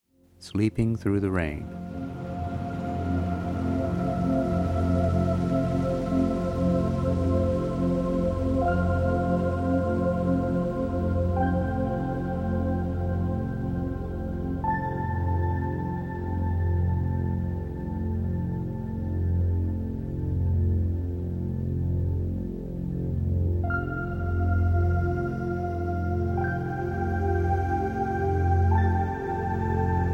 Sleeping Rain, Very relaxing and meditative music containing Hemi-Sync signals.